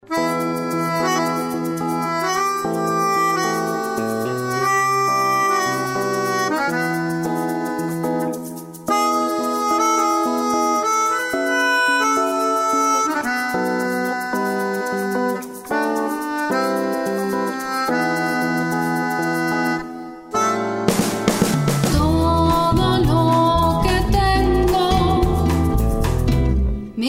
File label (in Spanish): mi guitarra; FESTIVEGAS CANCIONES 2009; romanticas